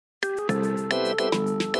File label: iPhone 2G Notification Ringtone